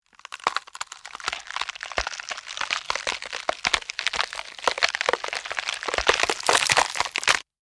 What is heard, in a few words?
Flesh Rip Tear, Bone, Crack; Horror; Blood and Guts; BlastWave